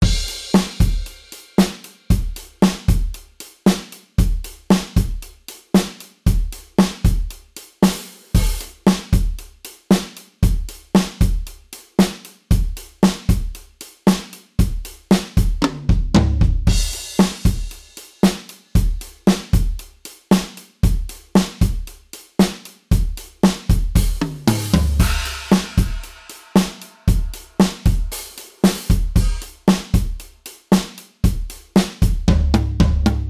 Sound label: modal surf, drums